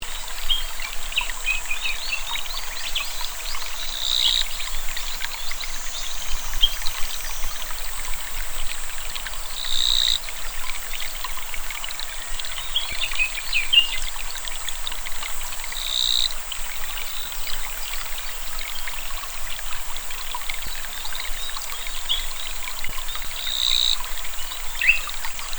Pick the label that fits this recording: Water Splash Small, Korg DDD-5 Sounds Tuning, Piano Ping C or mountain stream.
mountain stream